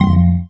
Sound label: Ricochet